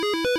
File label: beep